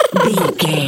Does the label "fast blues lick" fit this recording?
No